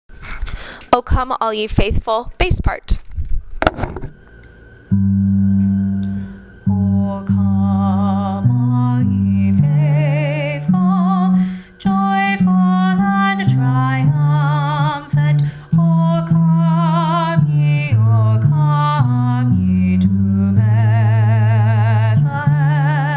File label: o come all ye faithful bass